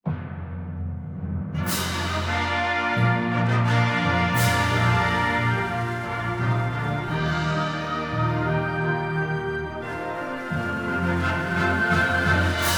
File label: Wind Symphony Fanfare; The Ocean Springs High School Wind Symphony